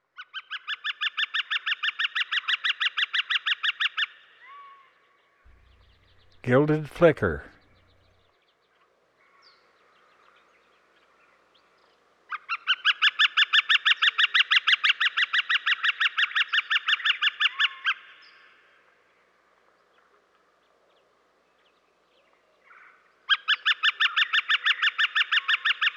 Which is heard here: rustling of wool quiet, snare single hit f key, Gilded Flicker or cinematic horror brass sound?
Gilded Flicker